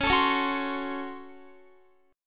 C sharp Major 7th Suspended 4th Sharp 5th, Guitar; C#M7sus4#5; strummed

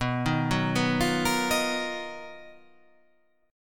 B Major Sharp 11th

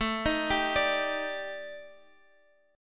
D sharp Major 7th Flat 5th, A, guitar chord

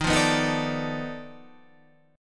B 7th Sharp 9th Flat 5th, guitar

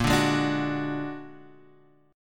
Bb Augmented 7th Bb Guitar Half Step Down, chord

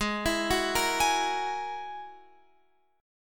Ab 7th Suspended 2nd Sharp 5th, Guitar, Open F; x,15,16,13,13,15; arpeggiated

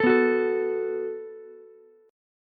Gb Minor Double Flat 5th, Ukulele; Gbmbb5 strummed

